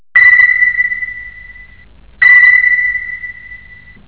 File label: sonar ping